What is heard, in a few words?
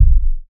Thud Dry